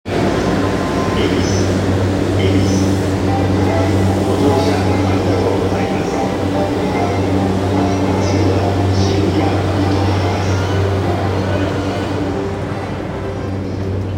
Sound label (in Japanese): JR山手線恵比寿CW